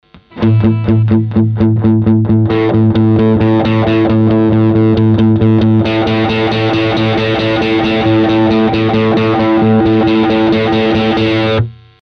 SPECIAL CRANKER Si Tone Turning

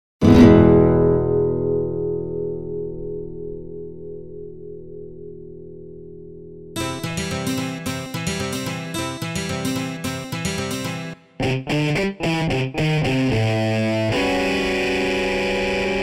guit

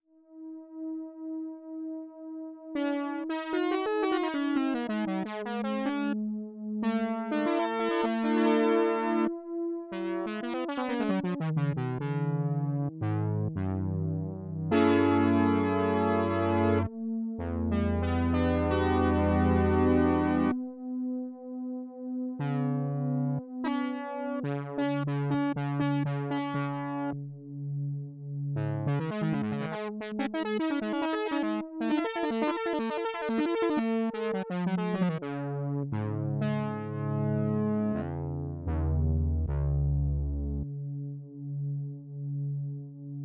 OB-Xa voice faulty

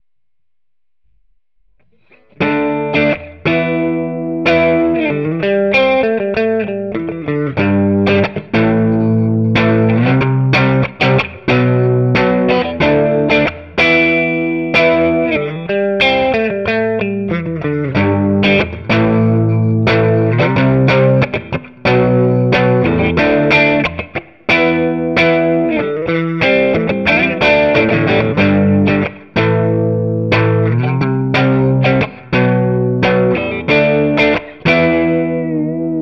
DL Clean Interface

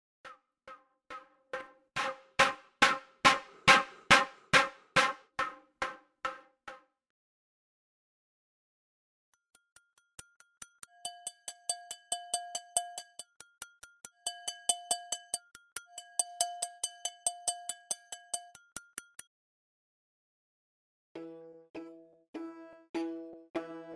Loops; Brunel

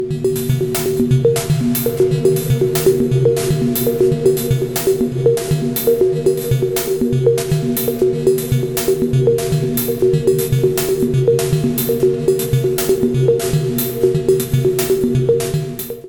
sine; VCV

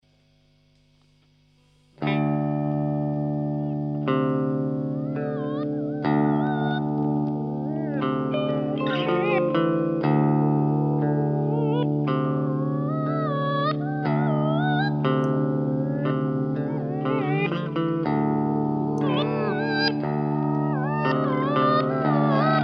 Earthquaker Devices Aqueduct Random

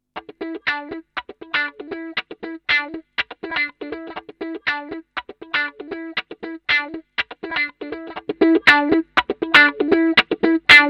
Guitar De Digi

